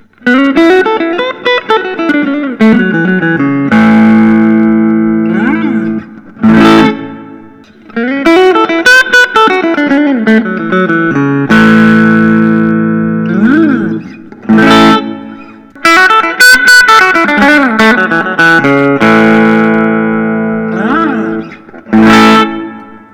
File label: prs mccarty 594 10 top; mccarty burst